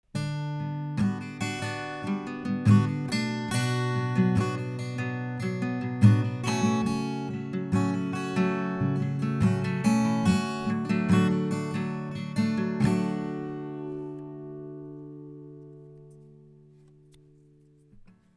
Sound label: audio technica at 2020 guitar; Audio-Technica AT 2020 - Гітара